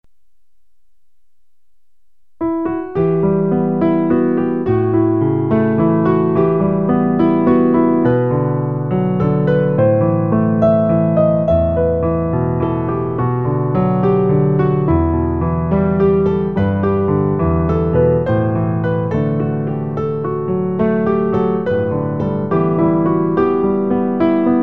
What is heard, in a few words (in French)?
piano; Si la mer se déchaîne; Pour accompagner le recueil Louez Dieu.